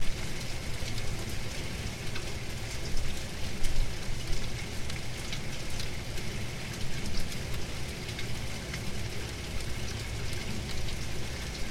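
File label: pleasant rain sounds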